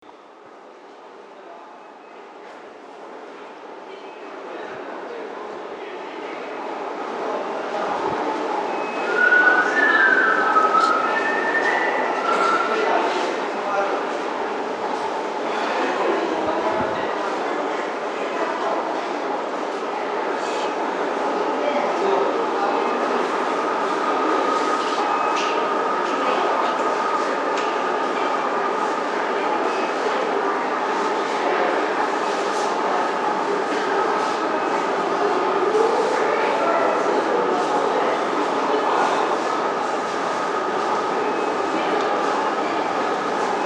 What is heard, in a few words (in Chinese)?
6号线 金安桥站方向 进站